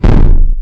ground pound; fowl-play; abilities

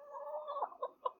chicken; fowl-play; enemies; hennifer

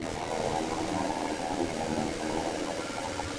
charge continuation, enemies; fowl-play; bob

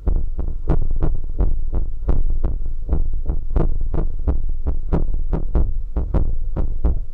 walking; woodpecker; fowl-play; enemies

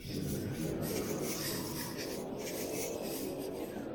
running; player; movement; fowl-play

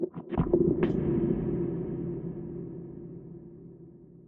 falling; fowl-play; poultry-man-menu

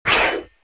sword swing; weapons; fowl-play

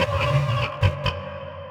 centipede; fowl-play; elder-centipede; enemies

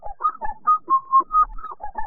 minigun; fowl-play; timmy; enemies

